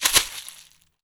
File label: Household Shaker, household rhythm samples Hits